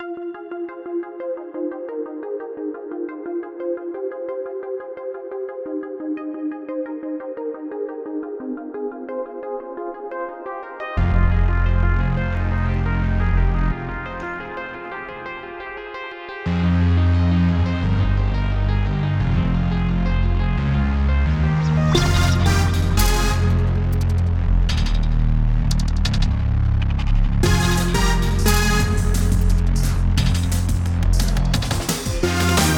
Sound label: Drum'n'Barka; Barki Wykopu